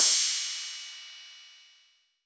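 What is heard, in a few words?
cymbal, ride; Chippss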